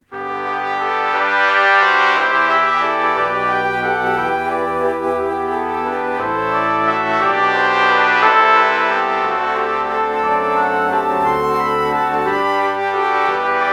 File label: alto flute trumpet morph